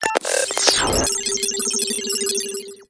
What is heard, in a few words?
system check beeps; benjamin matchup